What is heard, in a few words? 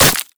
monkeyking basic attack; LoL